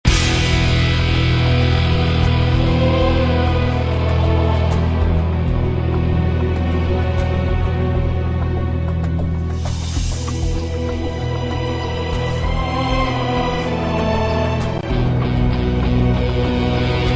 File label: soviet combat losing